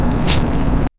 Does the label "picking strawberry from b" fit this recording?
Yes